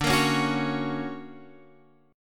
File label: D Augmented Major 7th Guitar Open F, chord; strummed; x,9,10,8,9,8; JGuitar